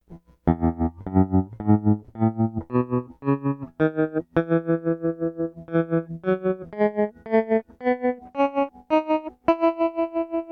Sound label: Fulltone Supatrem Max E Major, guitars; Pedal Effects